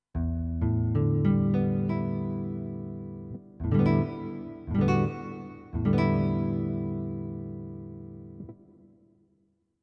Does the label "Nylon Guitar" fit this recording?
Yes